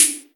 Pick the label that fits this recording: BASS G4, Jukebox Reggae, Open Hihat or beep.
Open Hihat